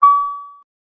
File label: ding